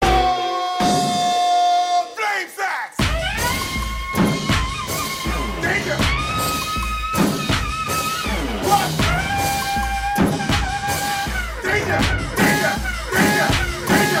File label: Flamesax; Terry crews